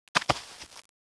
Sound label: Landing; cstrike; CS BattleRoyale Sounds